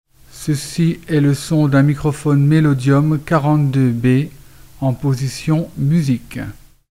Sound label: music